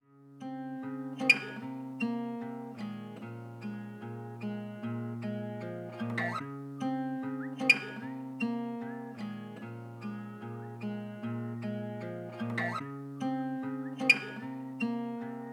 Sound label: lofi hip hop